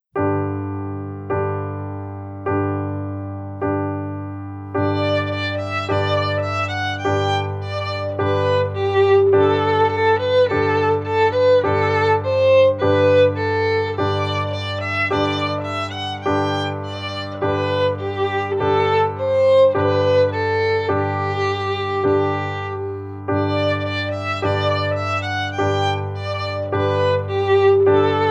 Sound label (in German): dudelsack melodie